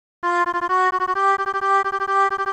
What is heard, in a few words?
3x Osc